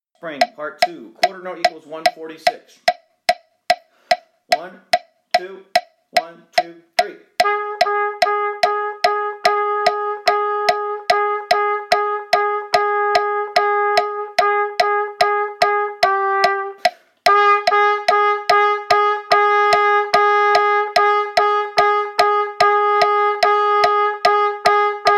Easy Duets, qn=146, trumpet

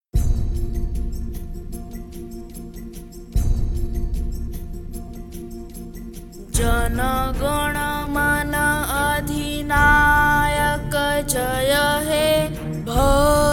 JANA GANA MANA National Anthem (in Bb); Indian Music

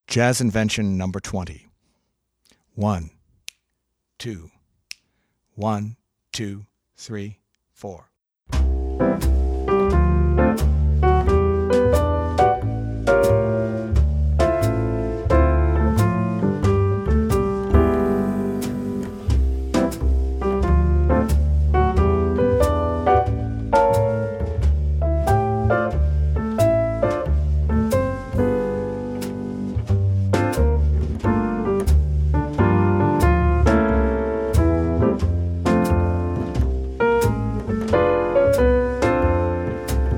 Jazz Invention, piano; Jazz Inventions for Keyboard

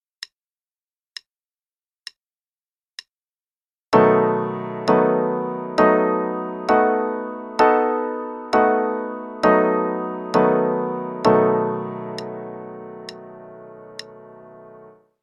V7 Chords in E Major (qn=66); CM Level 6

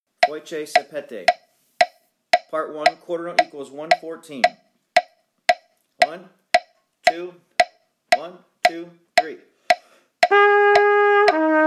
Easy Duets, qn=146, trumpet; part 1

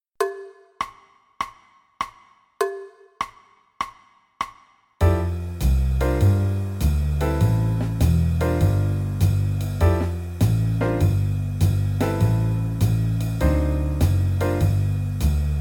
Play-along (in F, 1x, qn=100)

